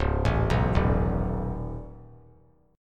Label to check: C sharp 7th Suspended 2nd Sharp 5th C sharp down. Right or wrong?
Right